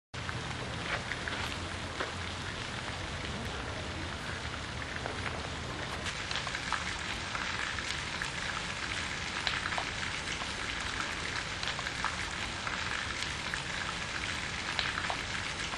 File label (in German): Trier barockbrunnen